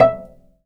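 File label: Cello pizzicato B; AKAI S-Series CD-ROM Sound Library VOL-2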